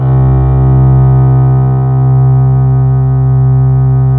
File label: CELLOS C2-R, CELLOS